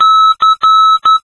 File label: bip